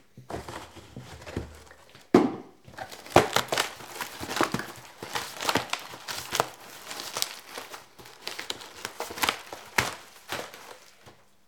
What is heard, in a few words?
Smashing Pizza Box